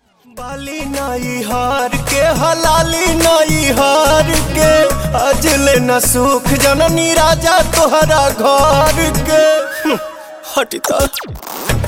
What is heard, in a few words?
Ringtone